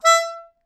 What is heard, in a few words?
Melodica Short; Short Notes Chromatic